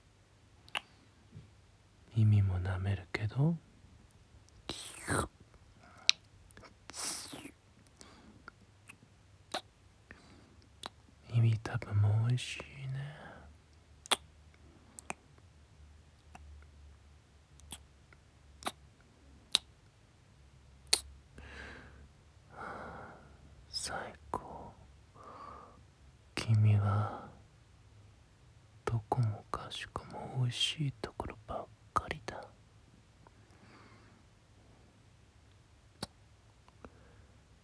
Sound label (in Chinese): 舔耳; 【色情精選】有感覺了？